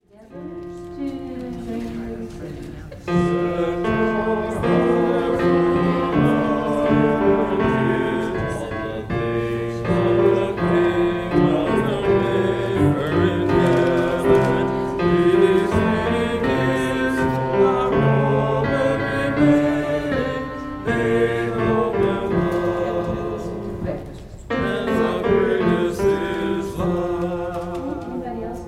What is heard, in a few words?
bass and tenor; Gather Hymnal